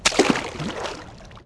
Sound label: Med Splash, Dino